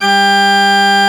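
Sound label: Church Organ C6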